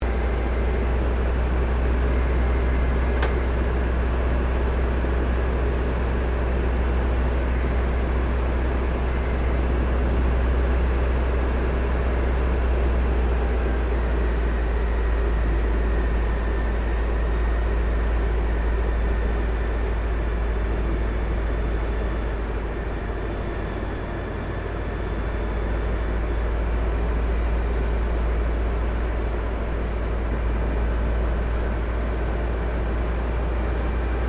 Aircraft CAC CA-25 Winjeel Landing Interior, WW2 era Australian training aircraft; Airplane; Airplanes